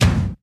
natural steel kick drum sound C key, kick one shot tuned to the C note